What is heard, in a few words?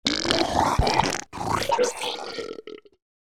Zombie Disgusting Sounds, Monster Sounds; TheDeclineOfWarriors; Monster Sounds Volume II